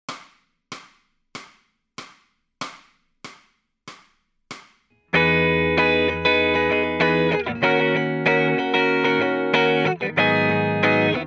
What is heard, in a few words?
Lead Backing Track, electric guitar